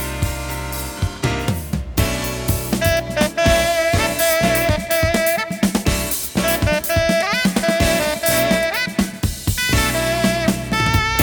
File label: drum and tenor sax solo